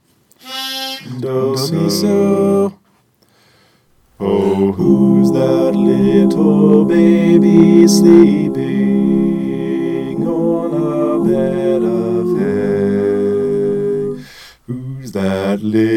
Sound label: whos that little baby tenor louder bass